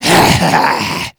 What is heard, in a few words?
Mariner Zombie Attack, Vocals